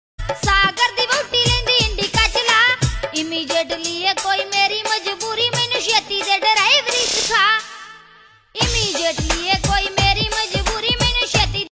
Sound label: song ringtone